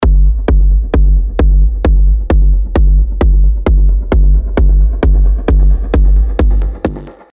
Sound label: Drum Bassdrum; SERUM